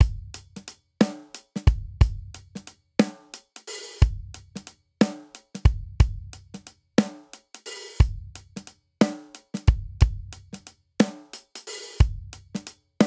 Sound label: Swing Six RnB Verse Variant 6/8 90 bpm, drum; Ableton; Factory Packs; Drum Booth